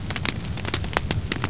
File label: Campfire